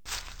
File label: grass, footstep